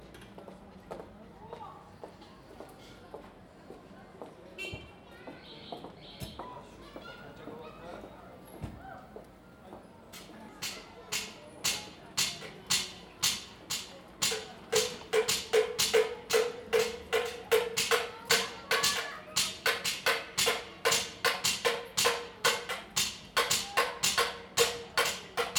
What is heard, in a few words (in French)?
les cuivres nocturnes Kashgar